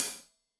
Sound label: Hat Loose, Hi-Hat, Cymbals Stereo